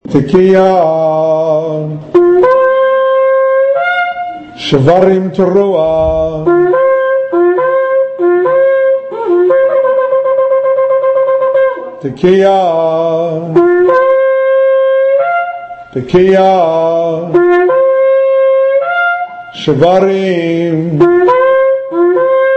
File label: Shofar Antelope's Horn 10 Blasts